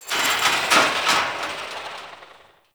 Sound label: Metal Scrapes; Ambience; Warframe; Corpus Railjack